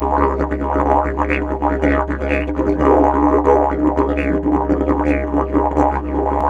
C# BURBLE, Didgeridoo; Big Fish Audio - Didgeridoo and Other Primitive Instruments; BURBLDRONE

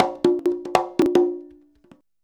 CONGA 119

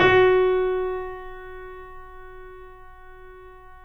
F#, The Piano; InVision Interactive - The Piano

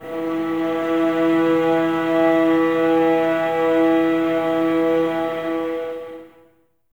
STR VAS E, String Ensembles, Violas, No Vib; Miroslav Vitous

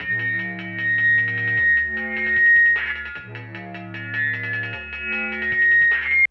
synth FX, Hardcore Breakbeat; Big Fish Audio; Hardcore Breakbeat Action; Gone Insane

